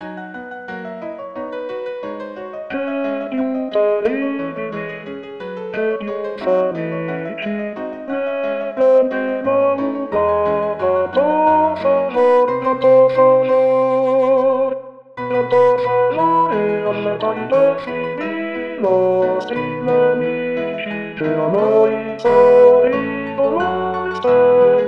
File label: d immenso giubilo satb